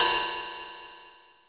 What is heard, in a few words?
ride, Roland D-10 L.A; Drums